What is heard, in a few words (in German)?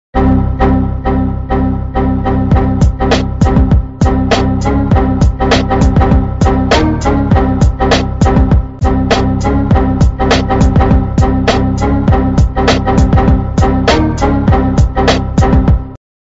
musik